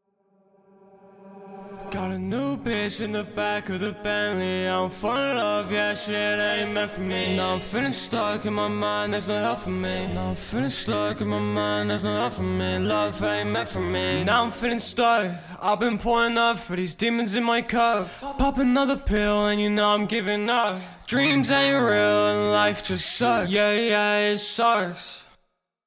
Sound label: hip hop 175 D sharp, Acapellas